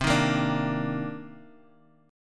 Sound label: C Minor 9th C Guitar; Cm9 strummed; JGuitar